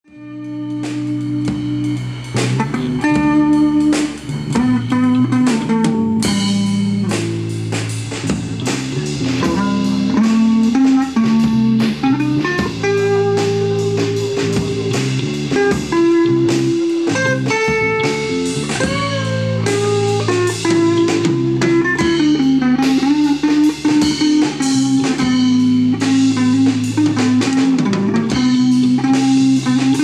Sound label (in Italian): Improvvisazione